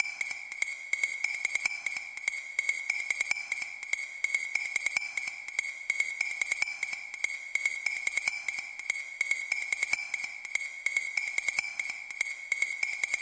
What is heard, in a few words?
bright percusso verbything, drum loops and breaks; 141 to 160 bpm